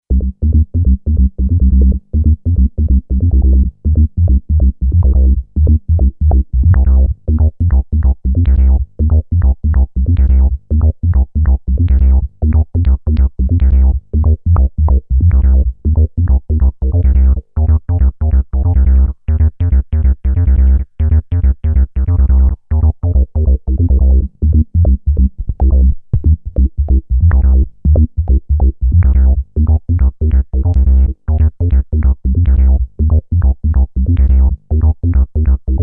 Peavey Spectrum Analog Filter Velo Bass

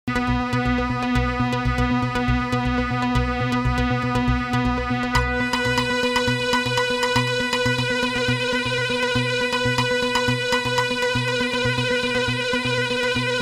MX61 Splitzones

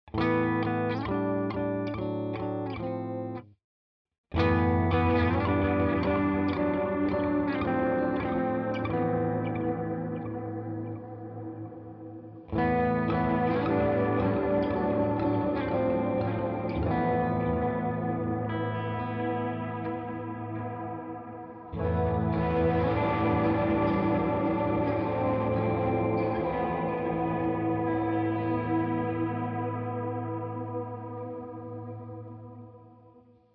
DelVerb Analog Mod Hall; UAD

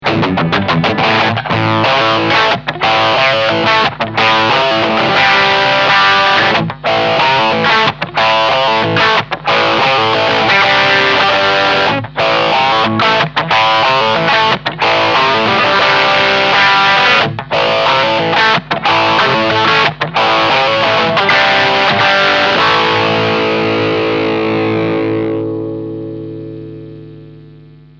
Medium Crunch Dry

